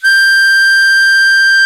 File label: HARMO G5, ORGAN